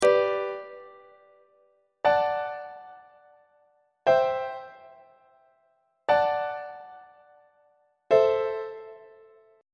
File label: g major deceptive cadence; on treble clef